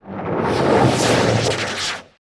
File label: Cannonball Incoming; Period Combat; Sony Pictures Sound Effects Series